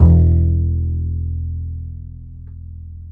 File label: E 2, Bass; Spectrasonics; Bass Legends